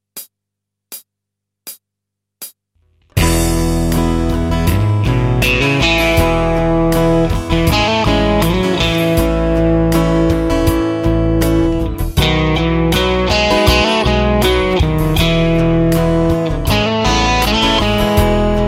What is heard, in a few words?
Choosing A Scale, guitar